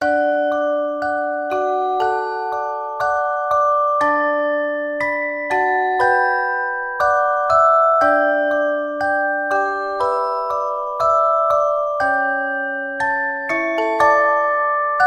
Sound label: Lullaby; MIUI12